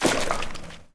paint blob splat

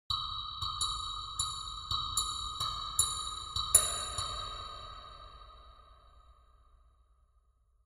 ambient synth grain click

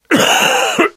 cough, survivor voice; source engine; left 4 dead 2; namvet

